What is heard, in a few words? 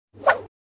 Swish Single